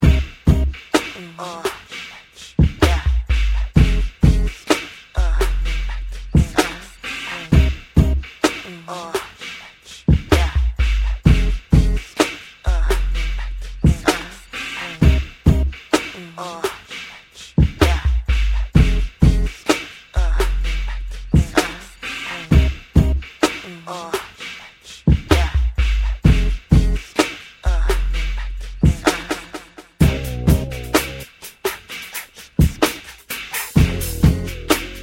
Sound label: instrumental